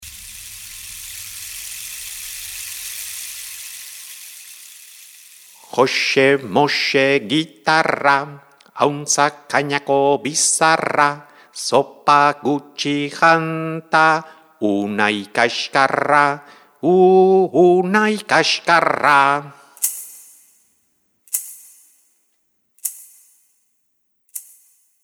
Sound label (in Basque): gitarra